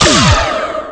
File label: shot; Nintendo